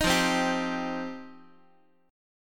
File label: F 7th Suspended 2nd F down Guitar; F7sus2; strummed; JGuitar